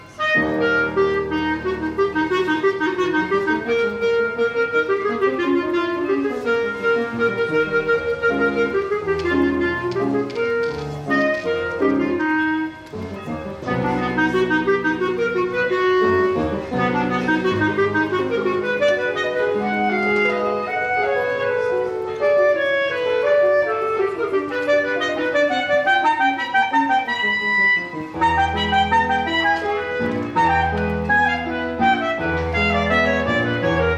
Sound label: dance preludes fuer clarinet and piano; Konzertmusikbewertung 2024; Frühlingskonzert 25.3.2017; Dance Preludes for Clarinet and Piano; ortsmusik ollersdorf